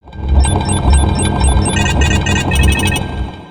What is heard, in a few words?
targeting, weapons; Exeter; aronwk collection; ndaudio